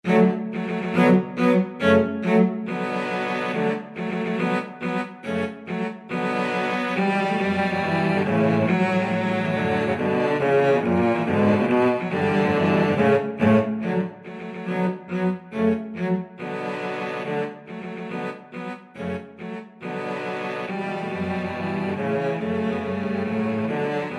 cello quartet; nutcracker

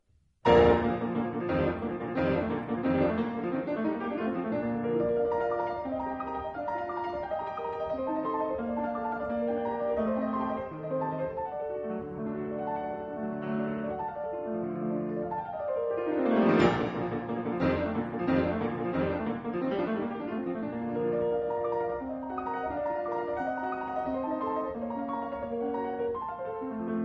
toccata, piano

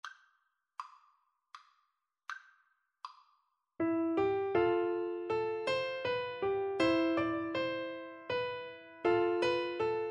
holst jupiter, Piano Duet

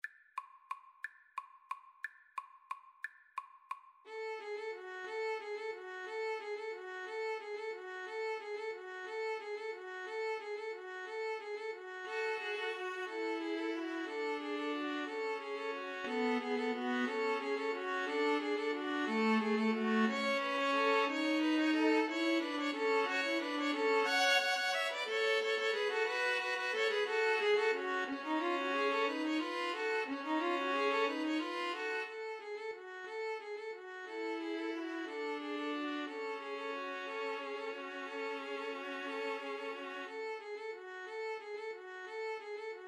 carol of the bells, String trio

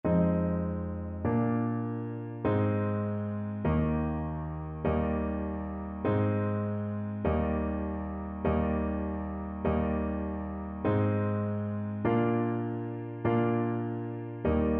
Trombone

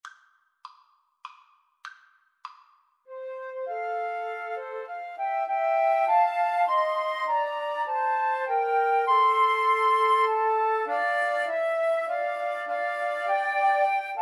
mendelssohn nocturne, 2 Flutes Clarinet

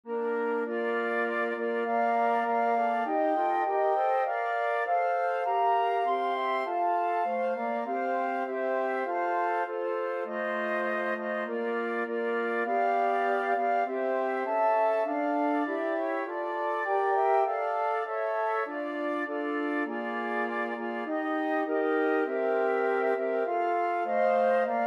navy hymn brass, flute quartet

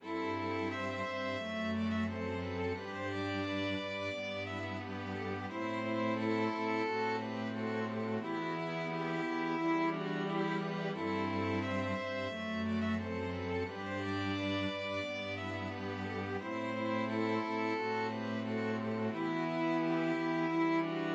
string quartet; borodin polovtsian

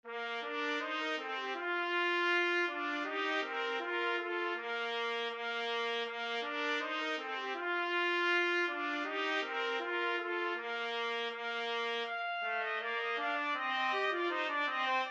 ding dong trumpet; trumpet duet

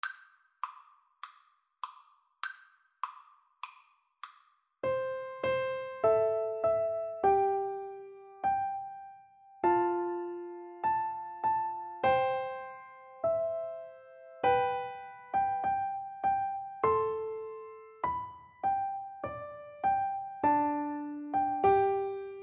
piano duet

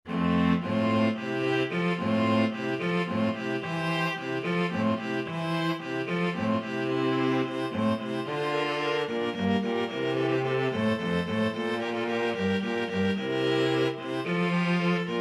flexible mixed ensemble 3 players

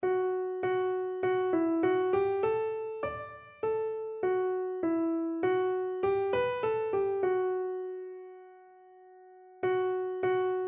savior like a shepherd, keyboard

